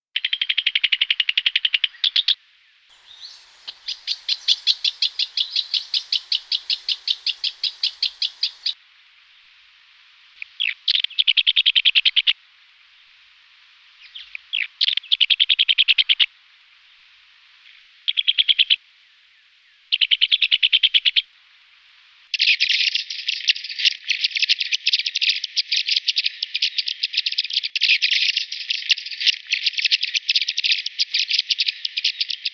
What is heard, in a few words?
brown Headed Honeyeater